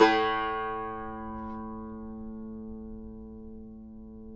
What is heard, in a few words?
CUMBUS A, Guitars; Spectrasonics; Hans Zimmer Guitars VOL-2